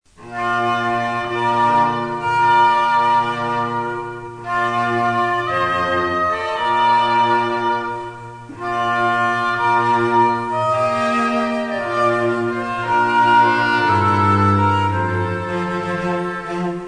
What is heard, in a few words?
Wedding March, Cello Trio